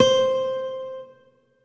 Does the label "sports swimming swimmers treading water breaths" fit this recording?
No